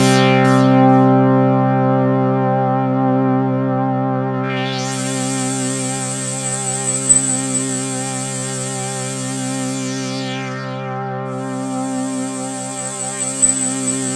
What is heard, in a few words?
Roland JV 2080, interval; MG INTERVAL